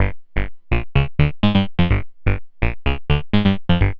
MICRORUN 126, BASSLINES

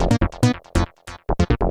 SNTH BASS ELCTR 140 A, Techno

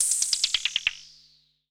SPACE FX 140; SpaceSounds

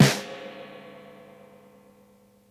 Snare Spring; One Shots; Touch Loops; ClassicBreakSessions; Sprung

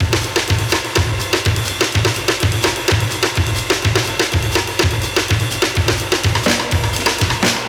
125 Classic Breaks, 125

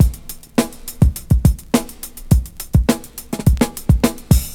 FUNKY 104 BPM, loop; 100-114 BPM

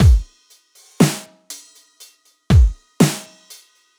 Power Break

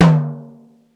HITOM

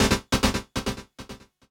KEY SHOT TEKNO 140 A; Techno2; Singleshots

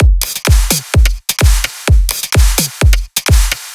drum loop 128 Full; Anthemic

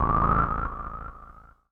KEY SHOT TEKNO 140 A; Techno2; Singleshots

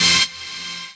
dance chord

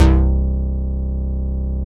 BASS STER.

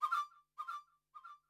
flute, ethno, 160, C

